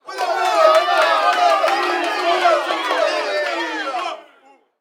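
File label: Street Ball Crowd Jungle Encourage, Crowds; SFX Kit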